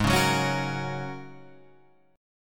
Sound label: G sharp 9th Suspended 4th, Guitar, Half Step Down; chord